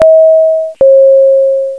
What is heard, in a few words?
cabin alert stereo; A32X